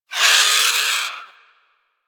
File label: Avichaea Vocal Death; Warframe; Hunting Critters